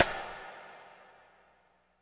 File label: AMB RIM; Spectrasonics - Burning Grooves CD2